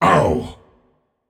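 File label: non verbal ouch, voice; cernunnos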